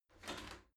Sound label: shower slide door slide short open